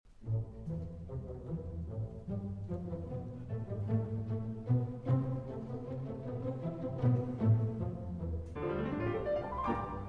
Piano Concerto in C Major, Allegro Ma Non Troppo (Live); Live in Australia, 2000